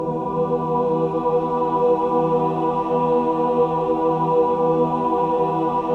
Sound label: min chord, Symphony of Voices